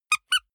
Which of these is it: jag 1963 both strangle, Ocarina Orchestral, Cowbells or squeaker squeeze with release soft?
squeaker squeeze with release soft